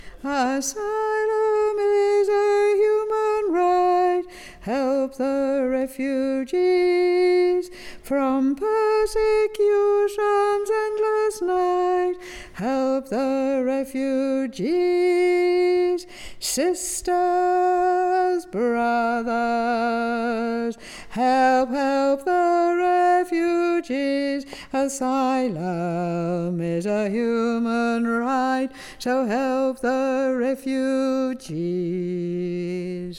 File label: Bass